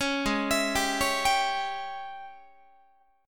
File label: G sharp Major 7th Suspended 4th Sharp 5th, Guitar; G#M7sus4#5 Chord